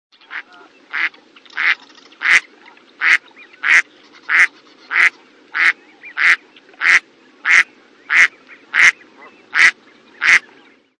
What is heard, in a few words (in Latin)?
Anas clypeata; voice